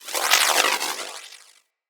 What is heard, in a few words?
Voltaic Sword Projectile Whizz By; Characters; Enemy; Abilities; Sinecure; Banneret